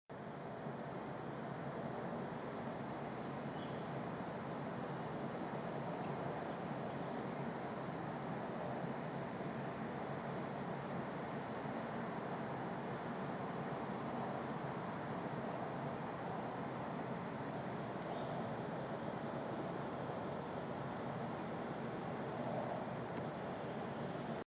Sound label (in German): boston grundrauschen